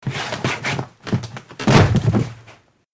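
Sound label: human falling sounds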